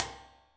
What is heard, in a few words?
step, bad cat; enemies; EscapeFromCatalunya